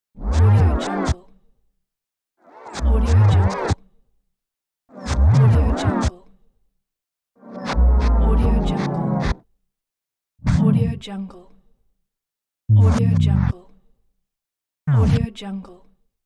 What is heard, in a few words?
vinyl slowdown emulation